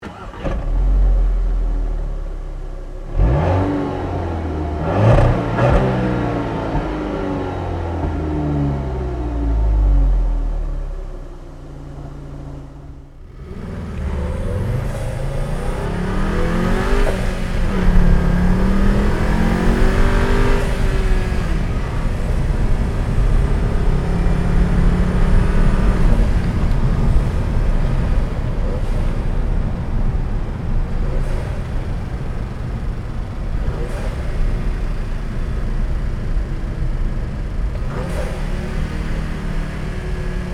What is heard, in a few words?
Volkswagen Jetta GLI